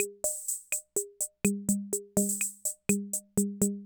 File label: Loop, classic machines; arranger